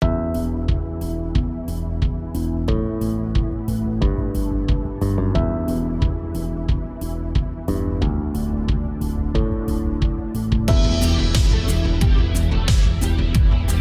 menu music

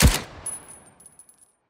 mp40 fire, weapons

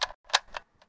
model 89 reload, weapons

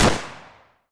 arx160 fire; weapons

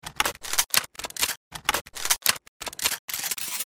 dual cz75a reload; weapons

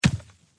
tree hit; hits

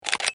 model 89 switch, weapons

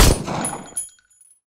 g19 fire; weapons

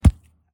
pumpkin hit; hits

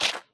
tablets pickup

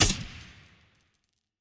vss fire, weapons

